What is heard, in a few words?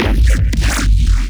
Growl Bass; neuro; BIG5AUDIO